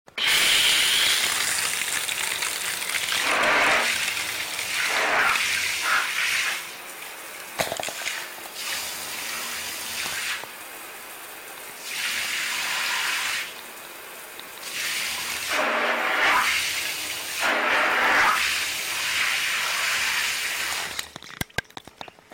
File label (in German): Duschen